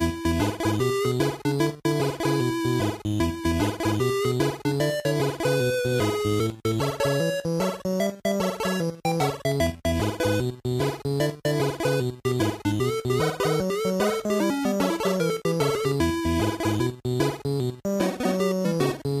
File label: Music Character Otter; save me mr. tako tasukete tako san switch windows gamerip 2018